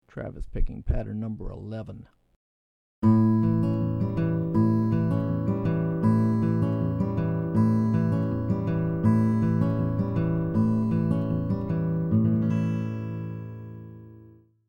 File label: Travis Patterns; travis style lesson materials